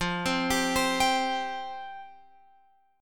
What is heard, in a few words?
F Suspended 2nd F Guitar Open F; Fsus2; arpeggiated; x,12,12,14,15,14